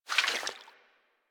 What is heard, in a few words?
Vial Foley; Warframe; Warframes; Alchemist